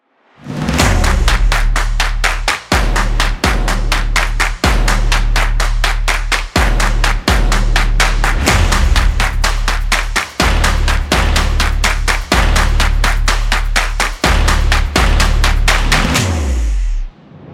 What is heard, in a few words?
Stomp And Claps; energetic; energetic percussion music